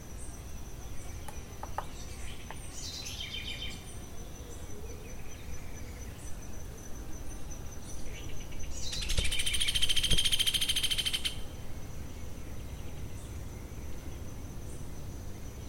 Yellow eared woodpecker

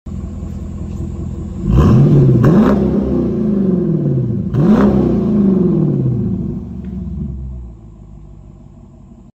Jeep Grand Cherokee

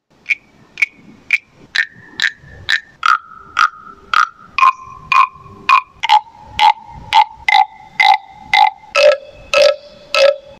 Wooden Fish

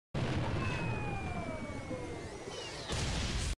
Meteorite

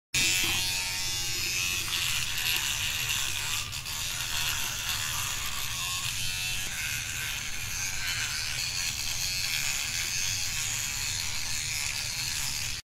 electric toothbrush; مسواک برقی

